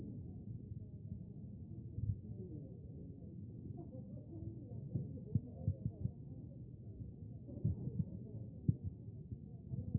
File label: A CHEST; B MODE